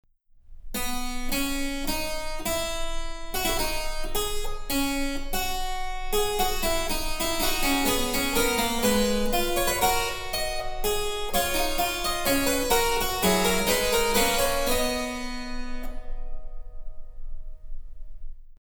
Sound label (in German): Cembalo; Mark Lindley WTC 1